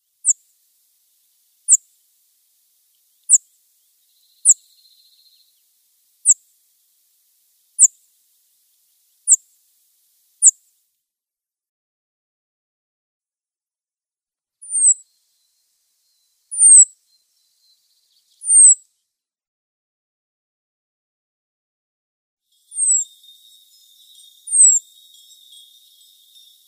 Cirl Bunting calls

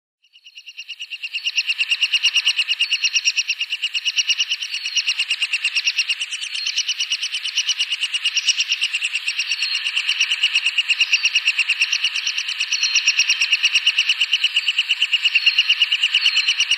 Lesser Spotted Woodpecker nestlings

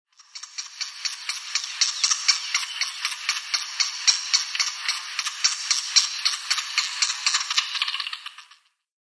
Grey-headed Woodpecker nestling

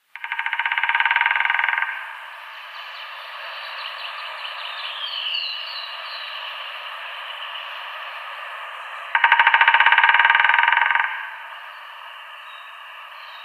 White-backed Woodpecker drumming

